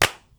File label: CLAPS; CLAPS+SNIPS